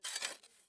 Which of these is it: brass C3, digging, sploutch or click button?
digging